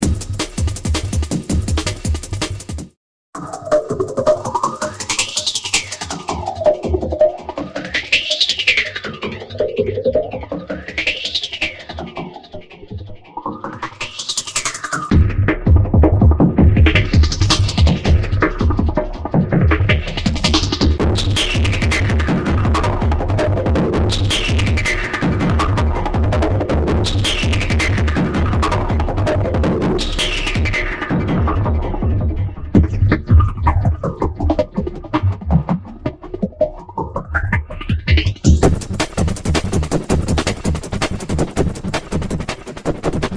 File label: input breakbeat